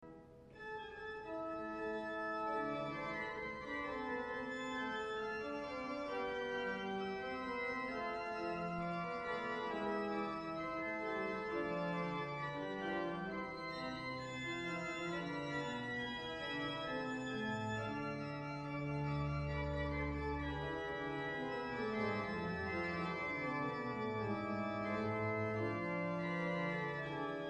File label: organ music, chapel; lumber chapel